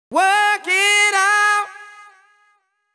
deep house male, 125, A, vocals

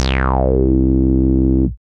BASS MONO

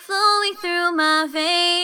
Vocals, Lead Premixed

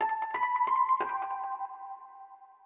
ETHNO 90 D

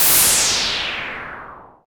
HOUSE FX 125

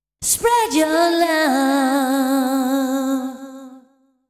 deep house female, 125, A, vocals

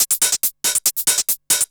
TECHNO HIHAT 140

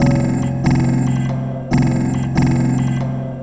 TECHNO FX 140

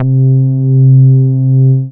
BASS MINIMOO

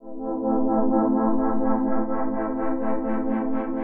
DEEPHOUSE ORGAN 125 A; Keys

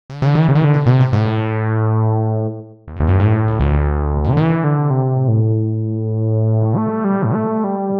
120 F Wine drunk, Bass Loops; Touch Loops; BlissedOutBeats

